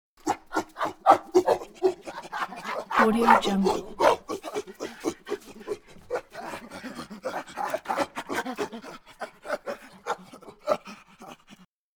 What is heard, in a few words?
chimp grunts; prosoundfx